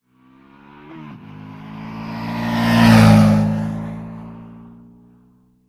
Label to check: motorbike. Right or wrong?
Right